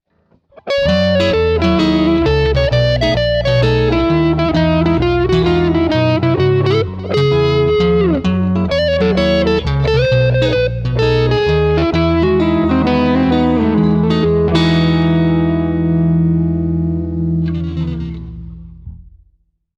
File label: jazz solo